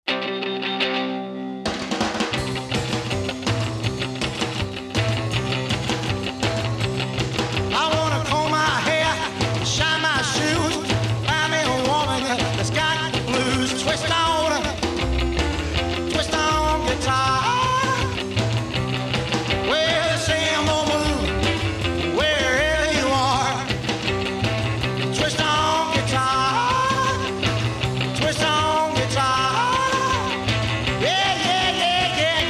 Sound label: Twist On Guitar